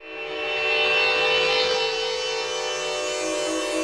SYNTHPAD, HOUSE, 125, A; eJay; Sphere

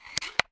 Drag & Drop Drop; 1944 Battle Of The Bulge

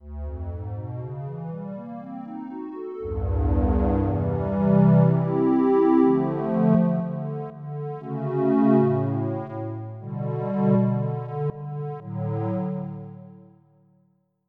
Warm Square; Onj's Soundfont

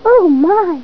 GASP

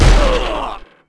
thump squish; UrbanChaos

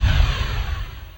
accel; Net Power 2000 #2

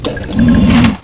Fact Screen Retract; Let's Discover Michigan!

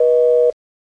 busy; MacAddict

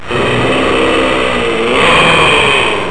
digi, electron; Easy Personal Web Page

